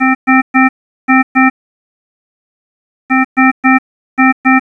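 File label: general high; alarms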